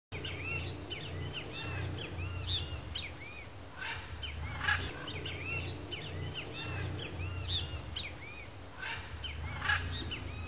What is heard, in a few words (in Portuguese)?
canto do bentevizinho de asa ferruginea